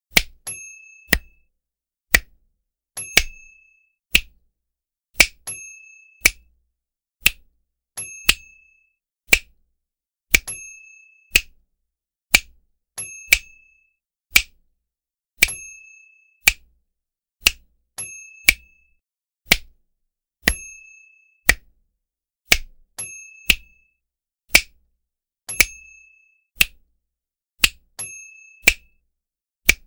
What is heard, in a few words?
Hand Snaps